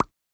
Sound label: Water Drop Notification Midday; Xiaomi Redmi-8A